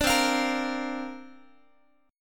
C 7th Suspended 2nd Suspended 4th, guitar chord, down; strummed; C7sus2sus4; 17,20,20,20,x,x